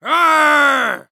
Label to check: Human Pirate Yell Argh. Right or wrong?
Right